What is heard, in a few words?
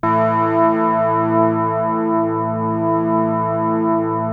PPG A2; Hollywood Edge - Giorgio Moroder Rare Synthesizer Collection; PPG.2.3